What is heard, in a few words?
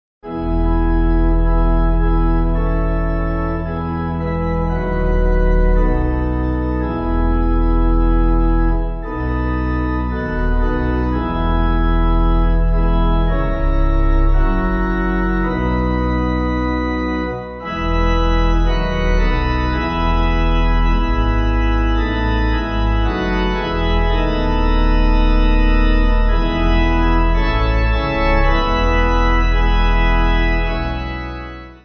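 Pipe, Pipe Organ; Jesus, and shall it ever be; Federal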